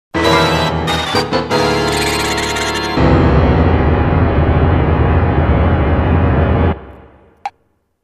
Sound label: jingle; 破滅ジングル